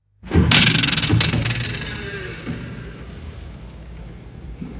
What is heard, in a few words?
vibrating diving springboard bouncing and decaying